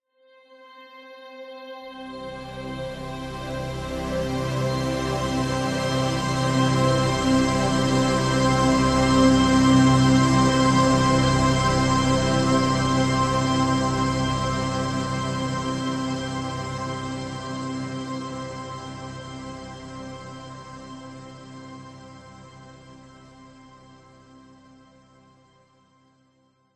sound design cinematic swell warm epic wondrous beautiful chords; zapsplat